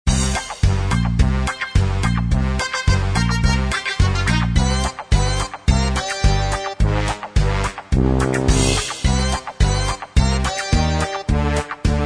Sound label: theme Inst Track